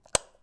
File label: button press; SimplyGladiators